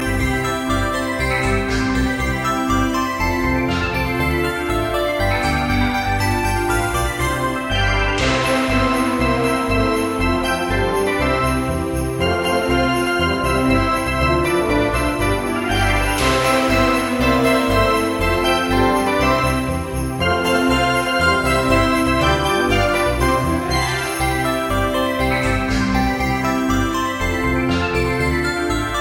Jingel Bell, Ringtones

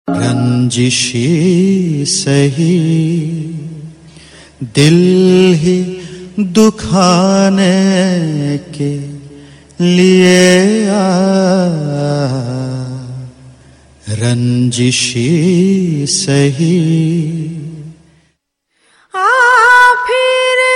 Duet; TV Serial Tone; SAB TV

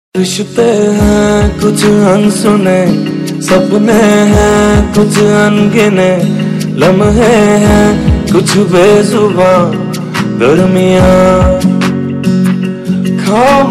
Male Tune; Rishte Hain Kuch Unsunne

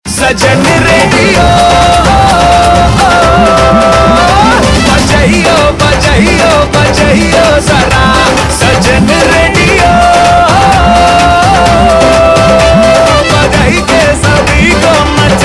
Ringtone; Bollywood; Tubelight

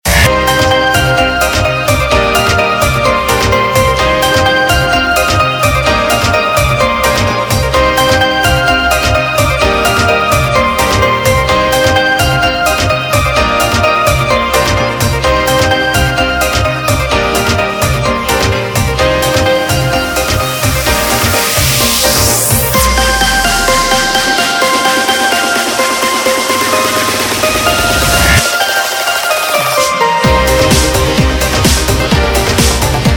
Instrumental Ringtone; Bollywood Hits (2014)

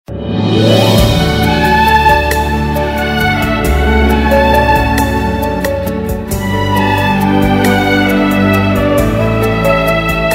Title Song Instrumental; TV Serial Tone; Sony; Humsafars

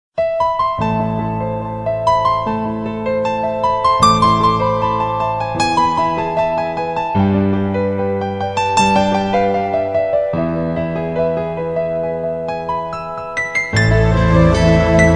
Romantic Piano Tone

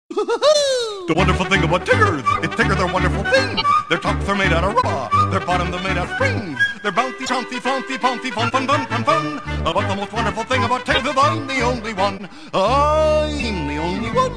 ringtone woo hoo hoo; Children Ringtones

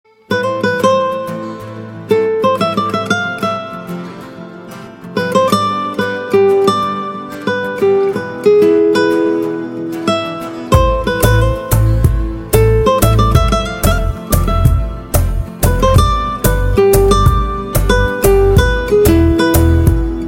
Instrumental Ringtone; Bollywood; Hate Story 4

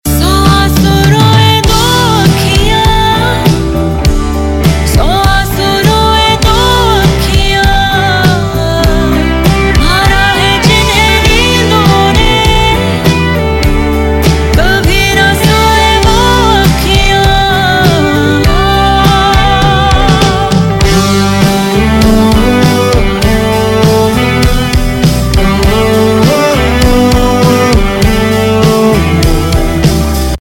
Female Ringtone; Bollywood; Katti Batti

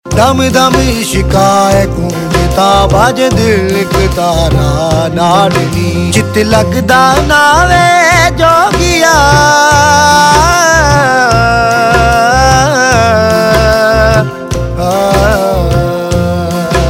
Punjabi Version Ringtone; Bollywood; Phillauri

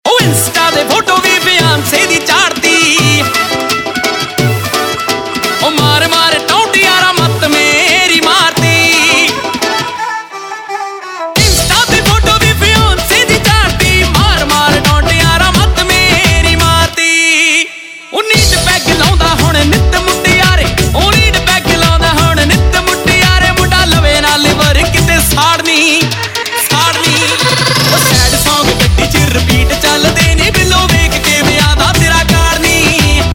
Bhangra Ringtone, Punjabi

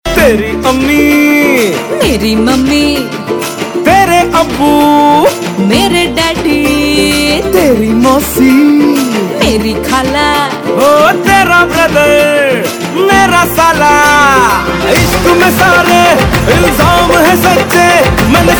Ringtone, Bollywood; Dishoom

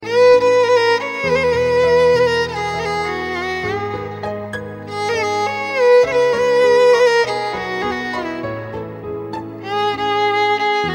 In Piano; Ringtones

